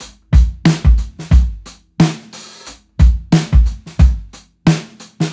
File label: 90bpm pillow bop drum loop; Hayman